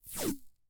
Industry Tool Tape Electrical Tape Peeled Back Fast